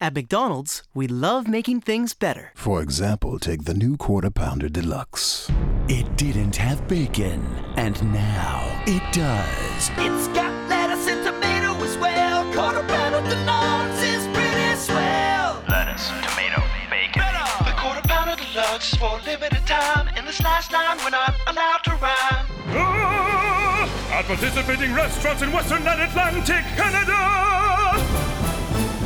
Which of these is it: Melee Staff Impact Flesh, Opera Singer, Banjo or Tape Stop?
Opera Singer